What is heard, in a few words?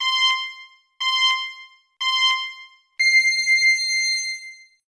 countdown